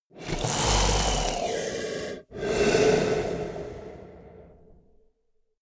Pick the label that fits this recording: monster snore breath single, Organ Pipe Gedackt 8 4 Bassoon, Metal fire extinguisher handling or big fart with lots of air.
monster snore breath single